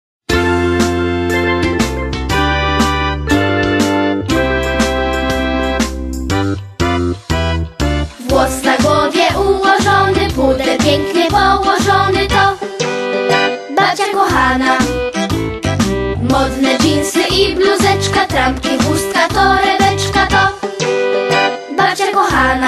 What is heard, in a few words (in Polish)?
babcia nigdy nie narzeka wokal; Dzień Babci i Dziadka - Przedszkole nr 52 w Gdyni